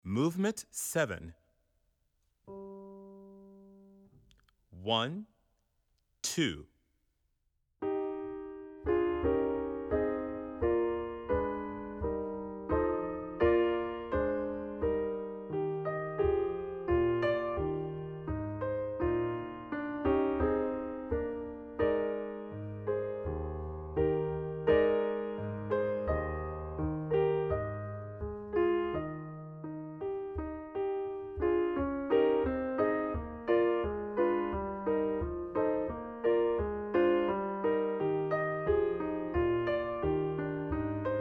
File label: Zigeunerlieder Piano; 7th mvt